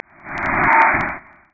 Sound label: Ping meteor scatter Gefiltert